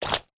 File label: household wet cloth drop into ceramic sink splat squelch; zapsplat